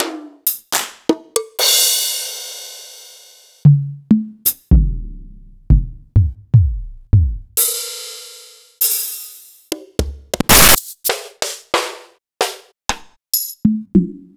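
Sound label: Roland MV8800 drum PLUTO KIT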